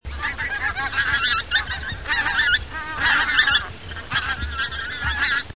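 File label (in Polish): krótkodzioba, gęś krótkodzioba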